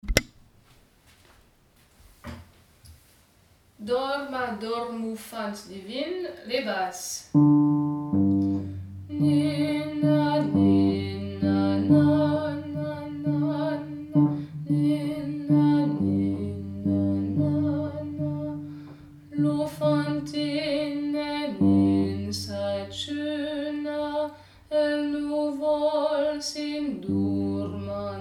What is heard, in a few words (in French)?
dorma basses